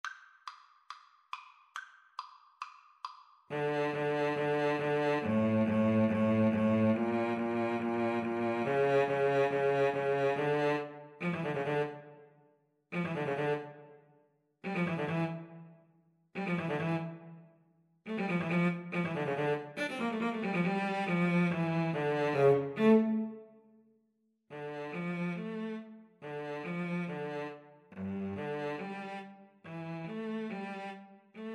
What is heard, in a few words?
violin cello, Violin-Cello Duet; mozart sym25